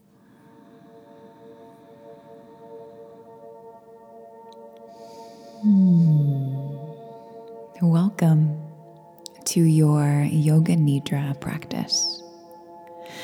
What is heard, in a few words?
waves of breath